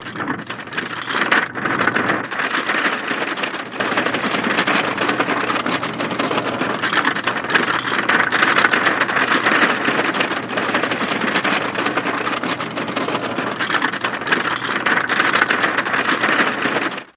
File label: Hospital Gurney Rolling Along Floor